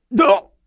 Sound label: Bum HURT; TrueCrimeStreetsOfLA; XBOX